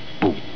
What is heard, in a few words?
boom